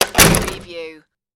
old door close

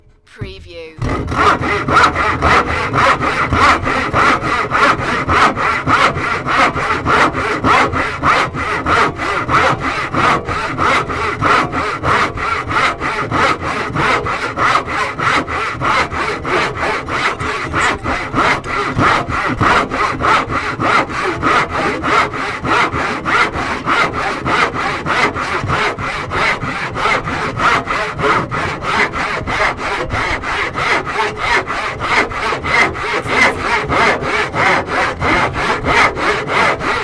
Hacksaw

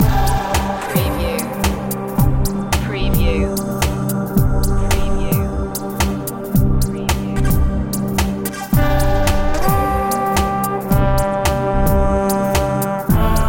deep in chill loop

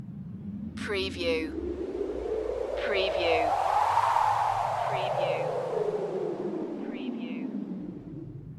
wind dry desert or space loop short

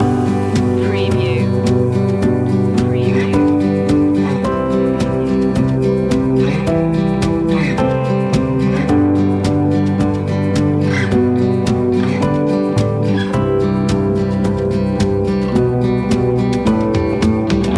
our last night loop

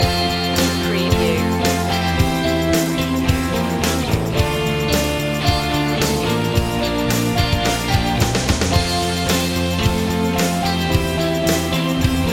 pop

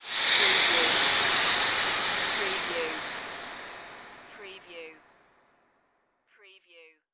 Swell Airy

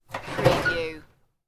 open locker door

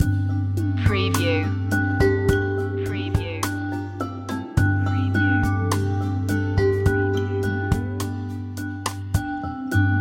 safari lite loop

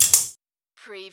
Button Sound Metal Wrench Move

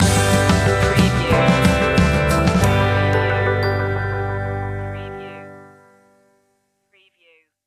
whistle along stinger

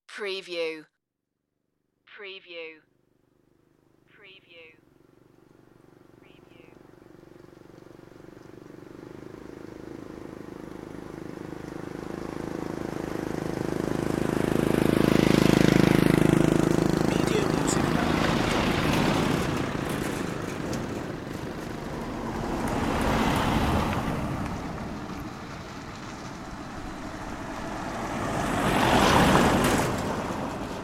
rattly cars and motorbikes

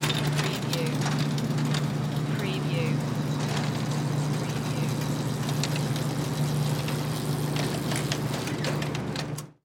Cargo Door Open Auto

